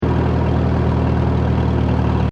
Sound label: Tractor Engine Loop